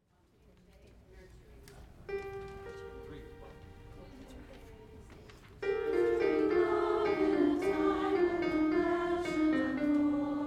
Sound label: soprano and alto